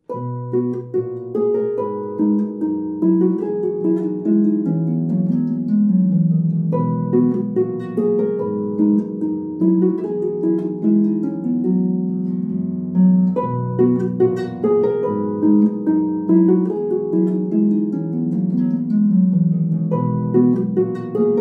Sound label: 18th Century March (Pedal Harp); british isle